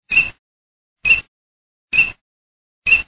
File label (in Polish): cyraneczka, kaczka